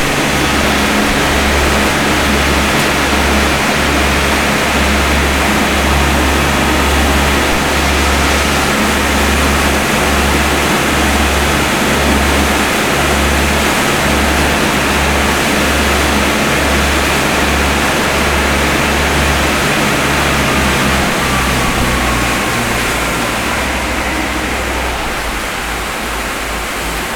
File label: boat onboard run stop; best service Blue Box; sea traffic